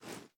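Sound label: pistol reload empty arm; gmod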